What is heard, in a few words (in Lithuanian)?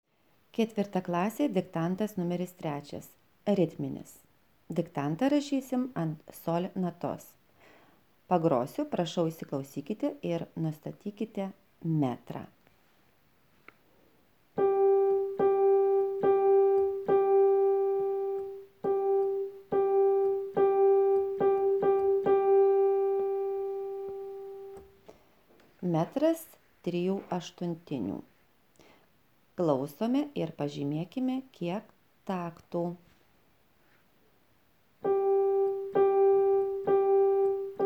ritminis